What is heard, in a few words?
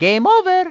gameover